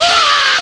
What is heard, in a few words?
scream cartoon; ooh ahh